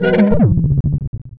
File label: dog phrase; hl2 ep1; npc, dog